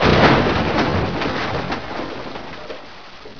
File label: WRECK; Pirates! Gold